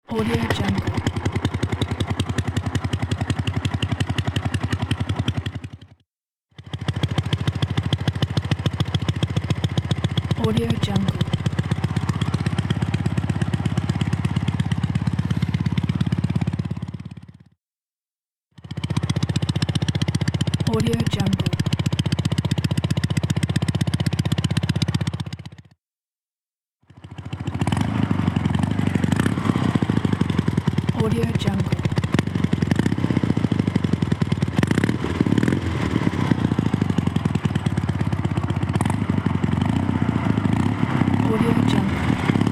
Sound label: motorcycle sound